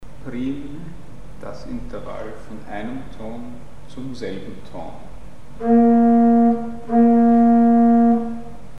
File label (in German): Prim; Mikrobuch